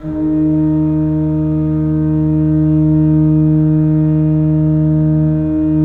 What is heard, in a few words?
3D Pipe Mix Celeste, 3D Organs